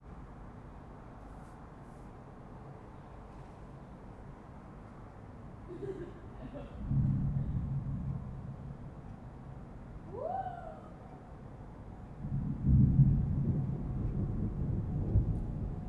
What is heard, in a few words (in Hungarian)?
gyerekkiáltás éjszakai repülő mennydörgés május vége; mennydorges 2016